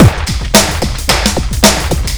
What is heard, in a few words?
shufflin beat; Sonic Foundry (Sony Creative Software); Ugly Remnants VOL-2